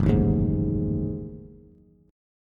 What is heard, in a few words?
Gb Minor 6th Add 9th Gb down Bass; strummed; JGuitar